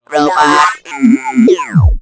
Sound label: Robot; little robot sound factory